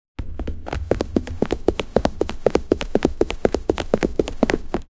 fast running footsteps; escaping

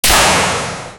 the sound of a water, play

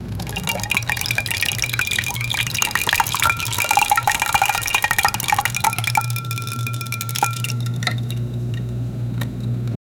soda fountain, beverage

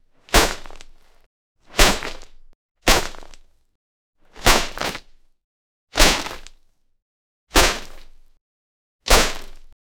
light armor warrior jumps; warfare

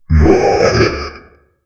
great demon awakening, supernatural

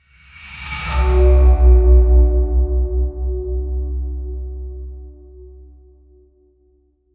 realistic sound of stretc, bow and arrow

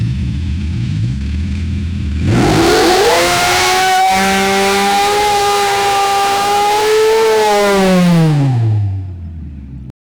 high acceleration formula, formula 1

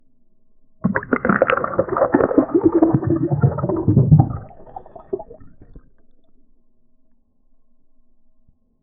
underwater dive sound, deep water; cap

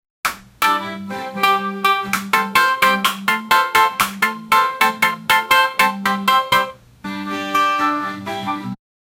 sound of rhythm and blues

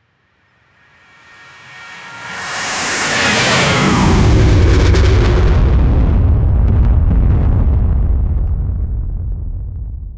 a plane crash sound; accidents

